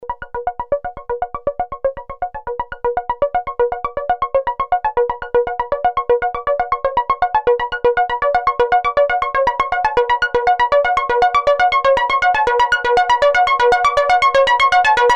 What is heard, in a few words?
Mixed Seq